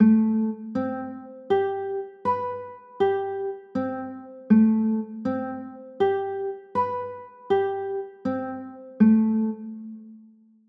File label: a m9, chords